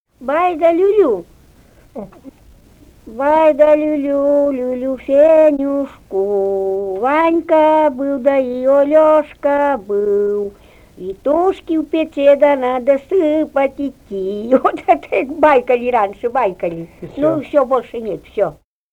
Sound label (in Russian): колыбельная; Бай, да люлю, люлю Фенюшку; Федяевская